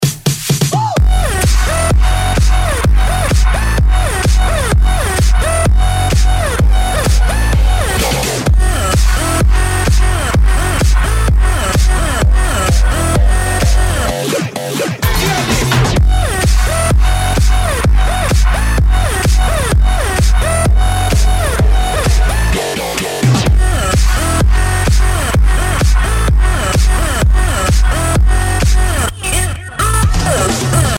dutch house

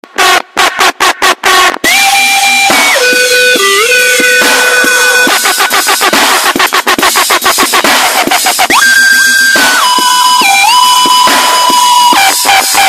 dubstep

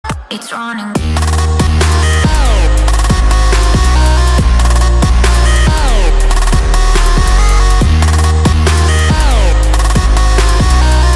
car music trap bass bass boosted mix

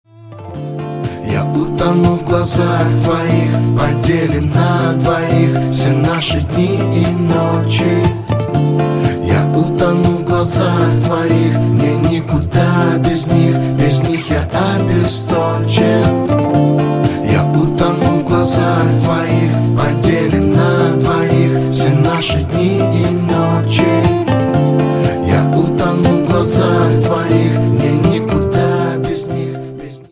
acoustic

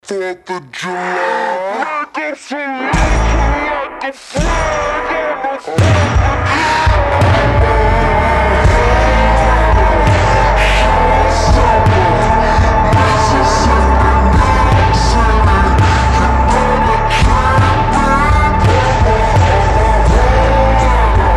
remix

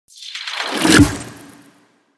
boom

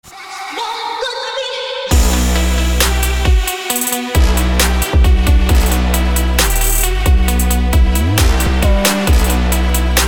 scary house

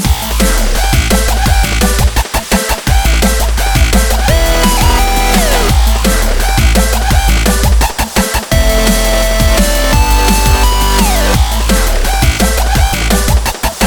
drumstep remix

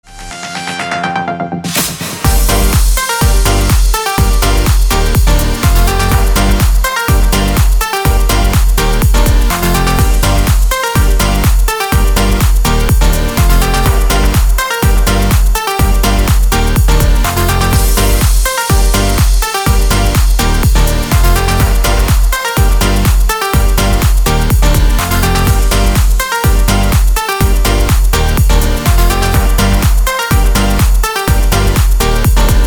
instrumental club mix

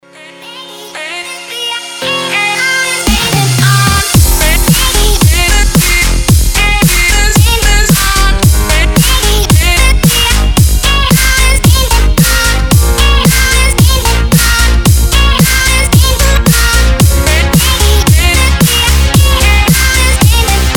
dubstep; klassnyy dubstep

